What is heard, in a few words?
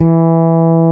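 rock fretless E3; Drums In Your FACE!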